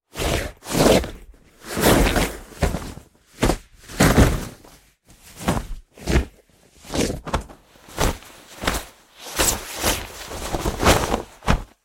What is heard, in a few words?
Whoosh Essentials; SoundBits; Just Whoosh 3